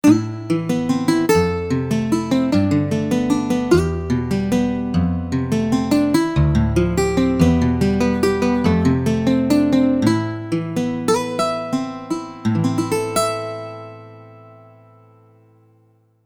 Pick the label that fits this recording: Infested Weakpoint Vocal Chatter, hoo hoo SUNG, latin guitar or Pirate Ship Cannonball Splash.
latin guitar